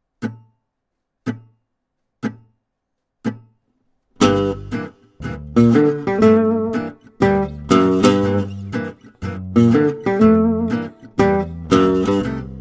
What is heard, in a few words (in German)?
Minor Blues mit Solo